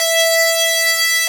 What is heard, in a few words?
lead